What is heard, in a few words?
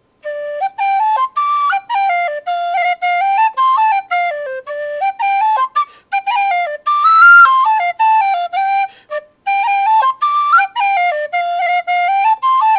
mist covered mountain, alba soprano c